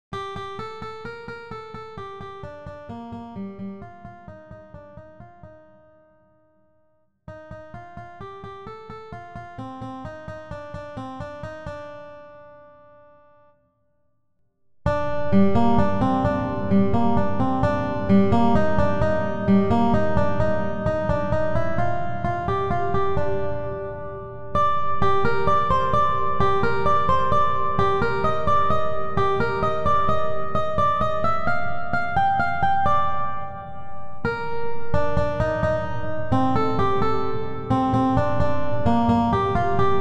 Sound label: guitar wedding of love